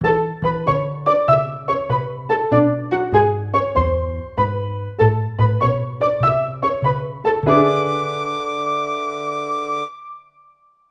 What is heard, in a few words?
Bari Sax